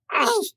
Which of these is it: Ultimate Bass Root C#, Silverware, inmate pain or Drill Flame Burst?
inmate pain